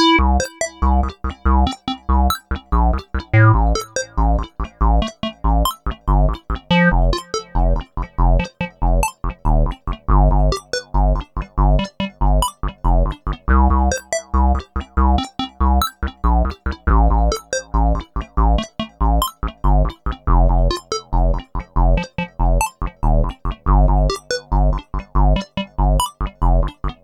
Blip Blop, looping music

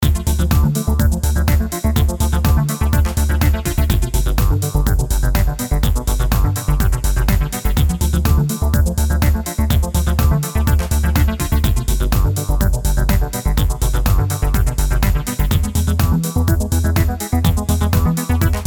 Quirky Action, action

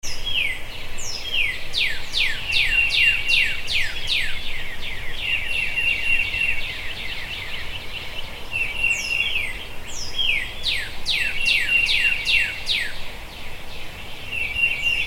Morning Birds Looping

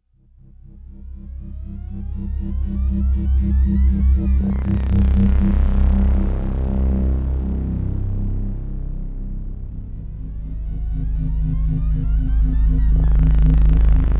Electro Drone

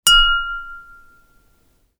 UI Quirky

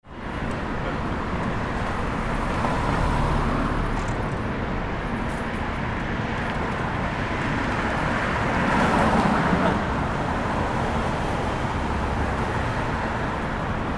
Street Corner

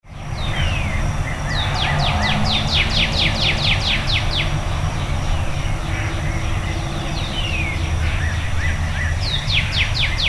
Birds Before Dawn

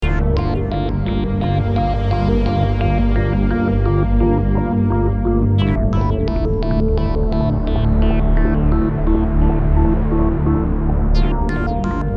Ominous Sci Fi Menu

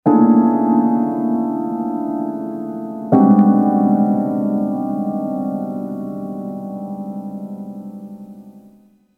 Creepy Piano

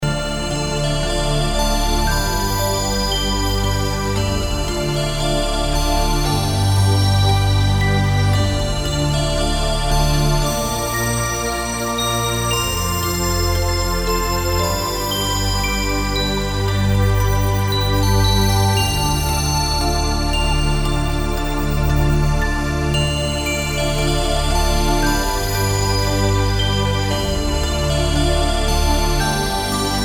Looping

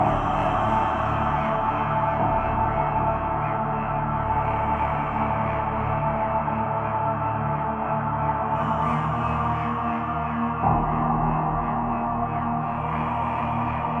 Death Canyon Lo Fi

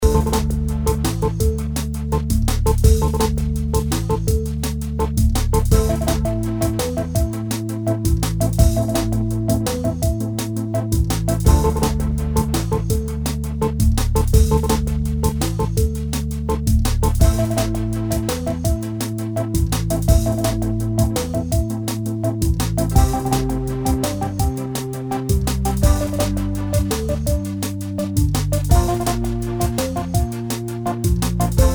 Frantic Gameplay

